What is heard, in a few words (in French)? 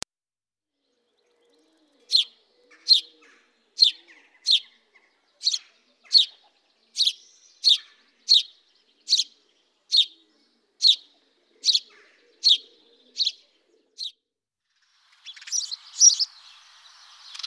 moineau domestique, oiseaux